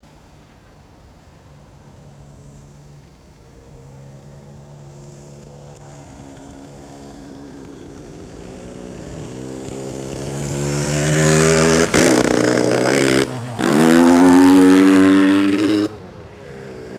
motorcycle approaches passes by and accelerates; vehicles

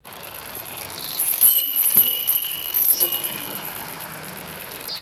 bicycle passing by ringing its bell; vehicles

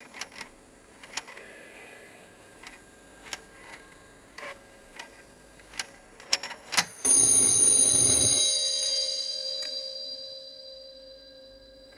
mechanical clock striking two o clock

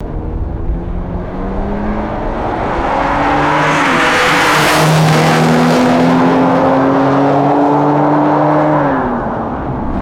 fast suv pass by and fade; vehicles

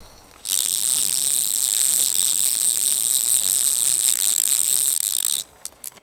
rapid ratcheting of a fishing reel; sports

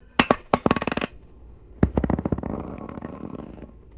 small roulette ball dropping and bouncing; game

